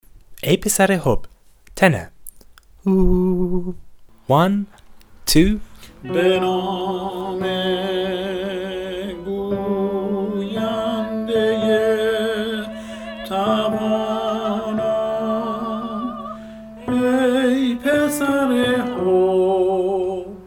tenor; ay pisar-i-hubb

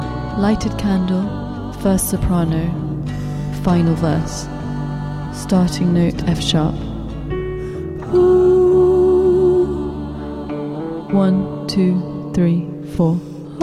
soprano, choral music

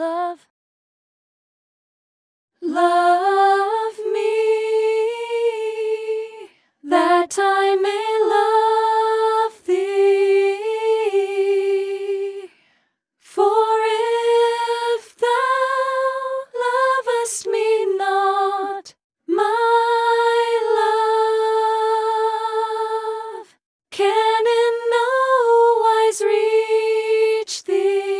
love me, soprano, Baha'i Choral Music

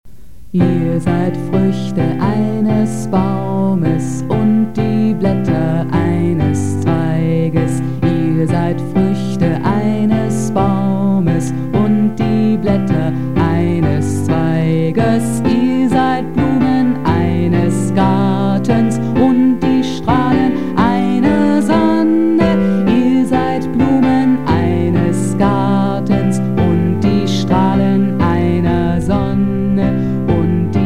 tenor, choral music

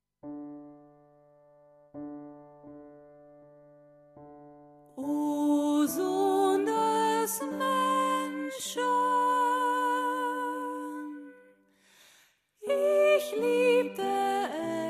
soprano, choral music

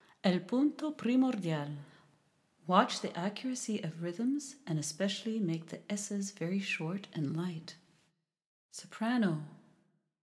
soprano, choral music

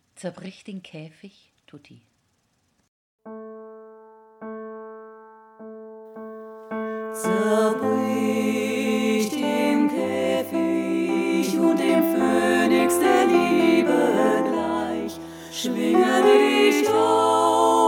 tutti, Choral Music

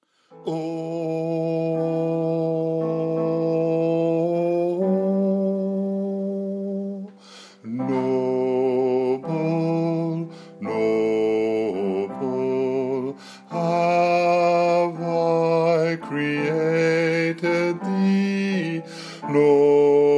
bass

